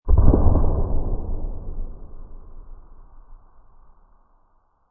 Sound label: Amb Hit